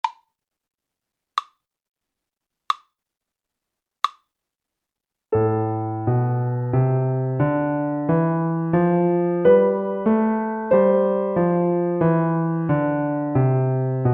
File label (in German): A-moll Scala slow